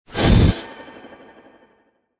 ranged magic missile only 15ft; ranged magic generic

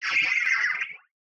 alien damage; calicrazed; sonniss gdc

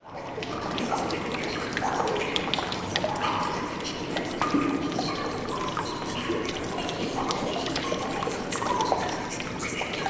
biohazard atmos background cave trickle; bluezone; sonniss gdc

